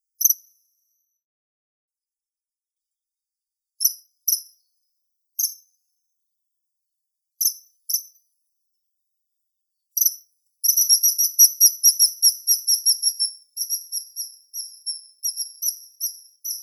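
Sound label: common kingfisher, Alcedo atthis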